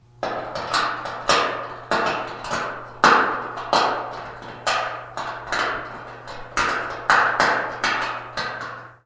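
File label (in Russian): камни падающие на металлическую поверхность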